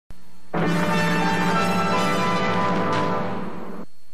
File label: warp speed logo; viacom enterprises